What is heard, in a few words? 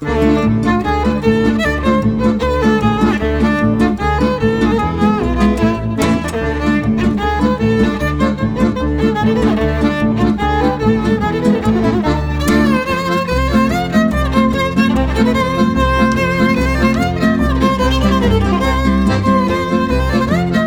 Nigun und Freilach